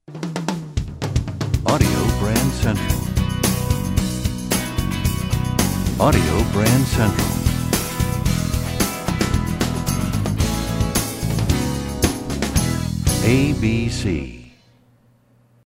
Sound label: Desert Wind, radio jingles